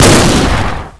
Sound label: ak47 fire